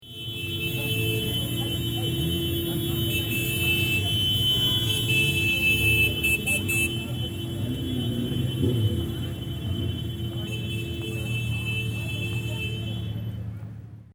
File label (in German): Silvester Hupen Menschen